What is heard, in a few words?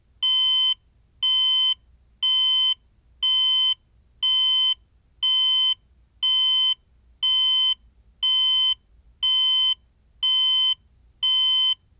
alarm clock